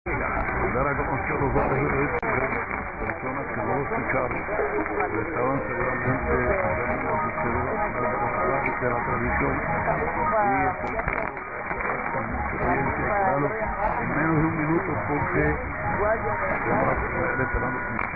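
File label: Bogota Colombia ID pips, radio; HJJX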